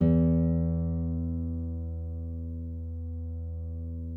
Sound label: NYL E, FLAMENCO NYL; Spectrasonics; Hans Zimmer Guitars VOL-2